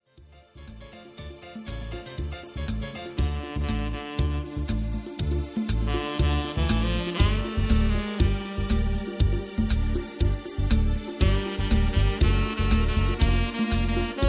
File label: sax tenor